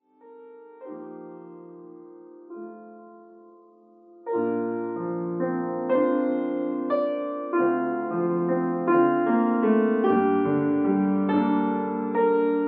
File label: Love One Another Piano